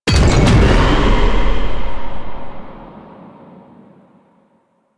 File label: Hazard Fracture Activate; Warframe; Duviri; Undercroft Hazards